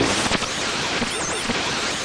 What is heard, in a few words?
Radio Noise, radio tuning noises